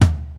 hi tom drum sound e key, Royality free tom one shot tuned to the E note. Loudest frequency: 569Hz; tom drum samples